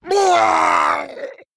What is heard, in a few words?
Male Murloc Death